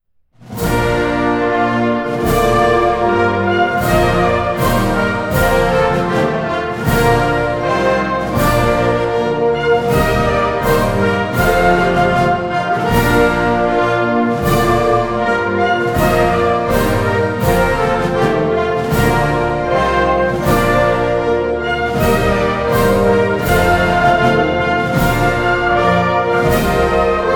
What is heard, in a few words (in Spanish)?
Himno nacional de Espana; guardiareal